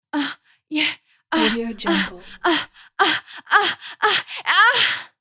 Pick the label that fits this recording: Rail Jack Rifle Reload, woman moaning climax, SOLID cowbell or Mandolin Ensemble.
woman moaning climax